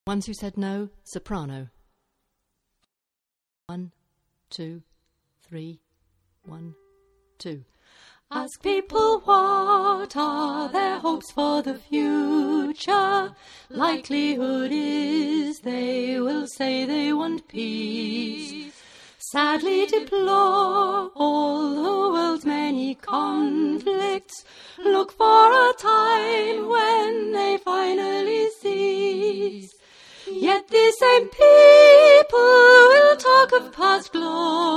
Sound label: The Ones Who Said No soprano